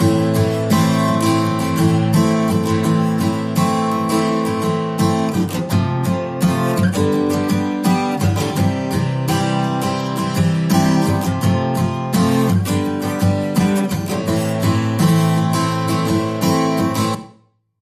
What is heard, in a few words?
Motivation Straight Ahead, No Drums